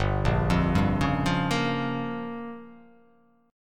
G Minor 7th Flat 5th

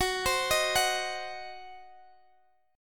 D sharp Minor 6th F sharp

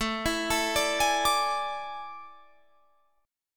A Major 7th Suspended 4th, Guitar, Drop D

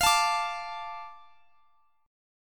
D 5th F; strummed; D5/F; chord

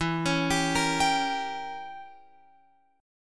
E Diminished 7th E, Guitar Open F; Edim7; arpeggiated

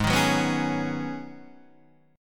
G sharp Minor 11th G sharp Guitar DADGAD, chord

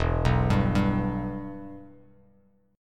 E-Minor, Bass Standard; arpeggiated; JGuitar

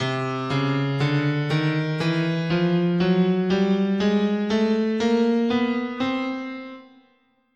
C Chromatic, scale

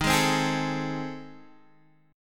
A Minor Major 7th Flat 5th

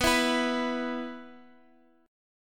E 5th B

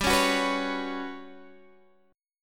G Major 7th Flat 5th G, Guitar Standard; GM7b5 strummed

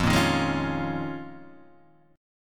D sharp 7th Suspended 2nd Suspended 4th F, Guitar

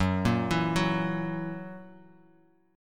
Gb 7th Gb down, chord; arpeggiated; JGuitar

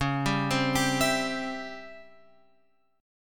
C sharp Minor Major 7th Flat 5th, guitar chord

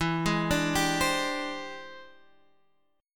E 7th Sharp 9th Sharp 5th, chord

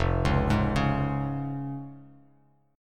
F Minor Sharp 5th, Bass Standard, chord; 1,4,6,6; Fm#5 arpeggiated; JGuitar

